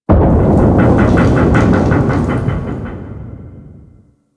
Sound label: Space Low Explosion Debris C; Warframe; Corpus Space